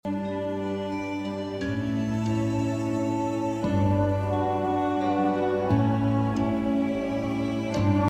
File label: meditation music sound bite